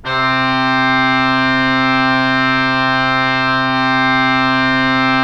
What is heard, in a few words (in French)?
Orgue Eglise Reeds C4, Pipe Organ Reeds